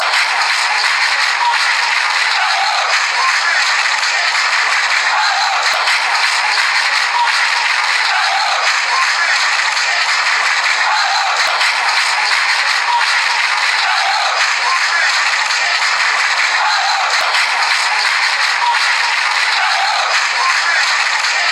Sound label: clap clap clap, fanchants; athlone